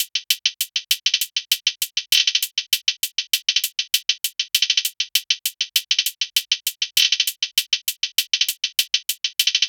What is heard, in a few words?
Phonk Kit 99bpm Hihats; EDM Drums; Drum Kits; Stems; Ghosthack; Drum Mega Bundle Demo; Ultimate EDM Drums; Phonk Kit 03